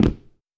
Dress shoe

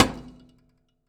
Metal

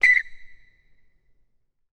Basketball Court

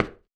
Cement

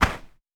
Snow

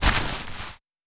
Forest

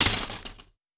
Glass

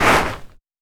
Snow

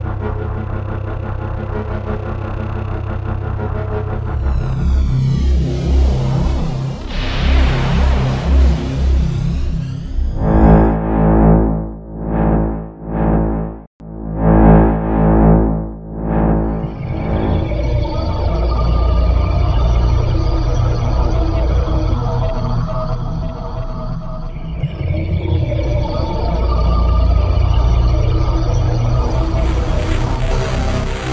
Tech loop

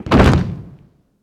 Soldiers

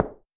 High Heel

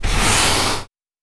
Sand gravel scrape

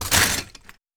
Glass

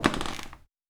Creaky Wood Floor

